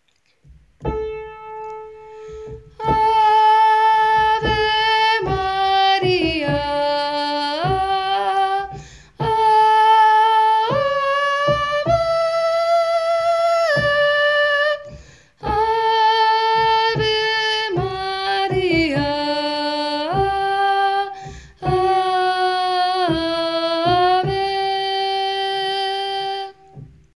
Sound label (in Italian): tenori